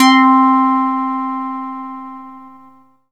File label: SQ80 PLINK, Synthesizers; Soli-Music; World of Synthesizers VOL-1